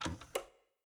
Music Player close; Vivo X80-Pro

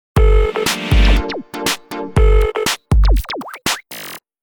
Message, Nokia 5530 XpressMusic

